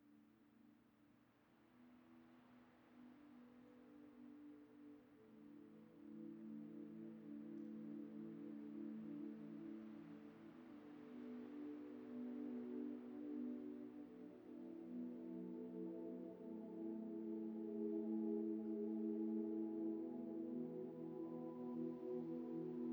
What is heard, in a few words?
White Noise, Native American Flute